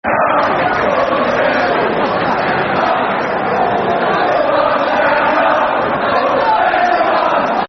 Stand Up For The Special One, Football Chants (Soccer Song); Chelsea Cfc